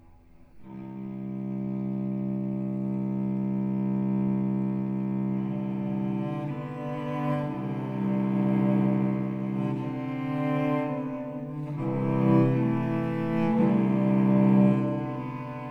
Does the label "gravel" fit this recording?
No